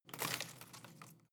home plant leaves rustle short

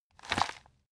plastic bag hit medium

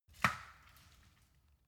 wood limb crack heavy

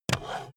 stapler drop

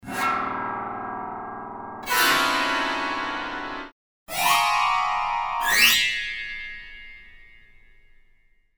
string up down

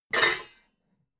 pots clank hard

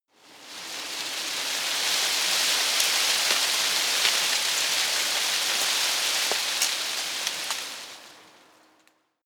tree foliage rustle medium

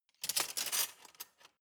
cutlery large multiple drawer grab soft

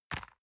envelope drop multiple medium

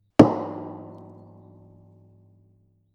metal impact tank solid

